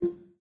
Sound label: speech misrecognition, windows 7; operating systems